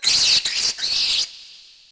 RAT; Urban Chaos

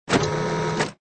Snack Machine Roll; scoobysnackmachine

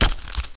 wpn hit; DAoC

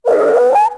wolf hit; DAoC

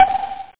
clave; drums; sr-16

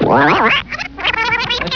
Rewinding

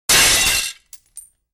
dish

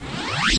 Offensive Item; Dungeon; Siege of Darkwood 1.0

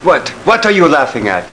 Who’s Laughing?!?

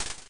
Splitter; Pushover

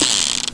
spin cylinder, cartoon sfx

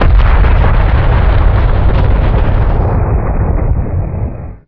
AGONY; Hidden & Dangerous; h&d demo

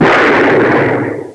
HOWITZER; Gunlok

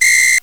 Samba Whistle L; GMPlay; Drums; TG300B

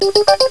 Info Beeps

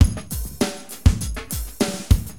Drums, AcidJazz Funk; LiveAct Pool

